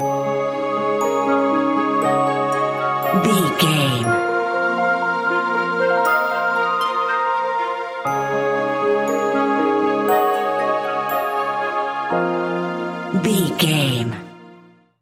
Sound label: enchanted mystical forest